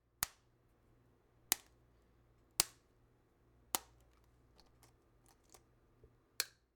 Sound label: Cracking Egg